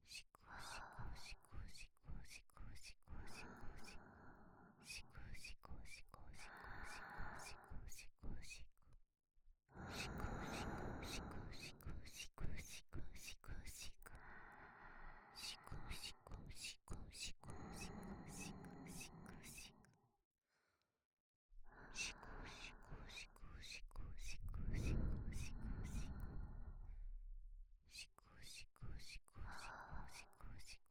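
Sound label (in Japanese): シコシコ×寝息; 五藤主任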